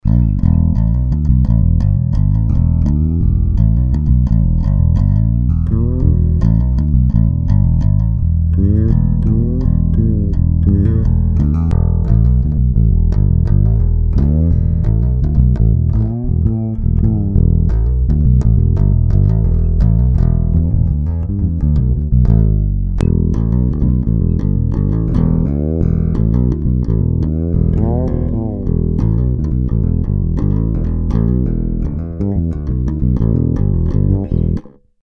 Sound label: round Wound EQ Bass Boost, bass; Ltd; Beide PU; nur Hals PU; nur Bridge